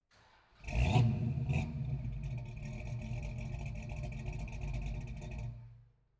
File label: car camaro start rev twice exhaust